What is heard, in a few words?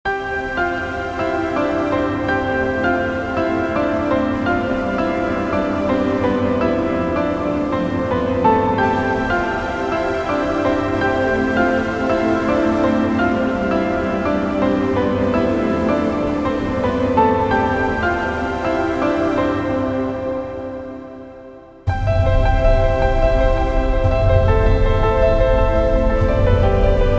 epic adventure